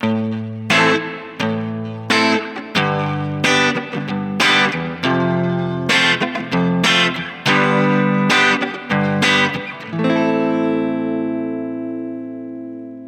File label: clean mid; harley benton airborne go